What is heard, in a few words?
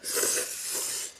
Suck